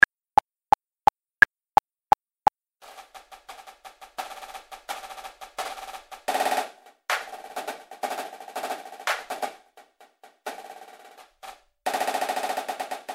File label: Playalong Segment Snare